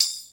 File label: tamb, Tambourine